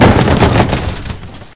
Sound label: bust crate, debris